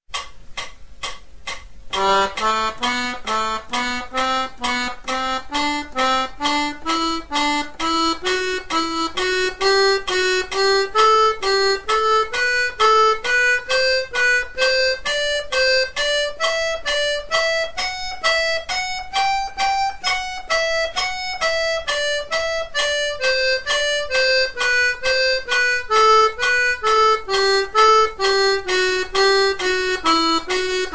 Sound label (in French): gamme sol pousse tire par 3